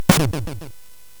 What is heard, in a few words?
SFX; Narco Police; hvsc